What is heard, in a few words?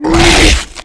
eat, offspring; giants